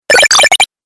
Game Console Arcade Power Up, Video Game Sound Effects